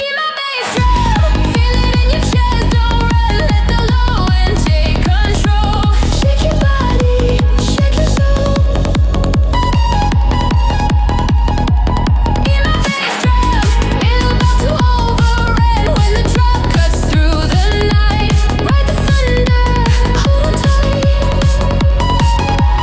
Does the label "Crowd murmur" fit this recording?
No